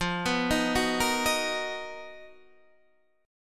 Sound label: B Major 7th F, chord; Bmaj7/F; arpeggiated